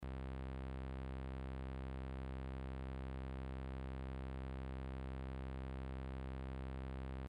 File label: 65hz control; Plague Bearer